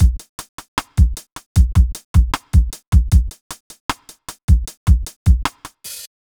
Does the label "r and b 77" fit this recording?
Yes